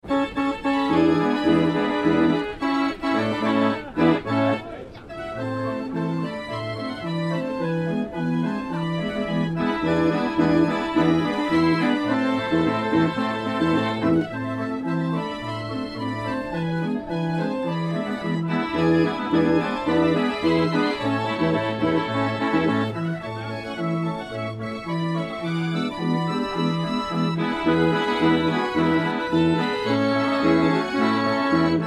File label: Carousel Music Fair Organ, Merry-Go-Round; Automatic Music Machine playing.; Amusement Park Sound Effects; Carousel Music 03